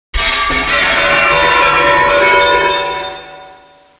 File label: smash